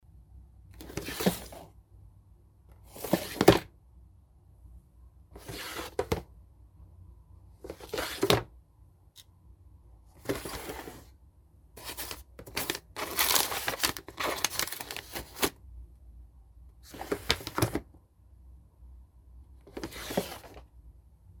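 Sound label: plastic three compartments; iz lerua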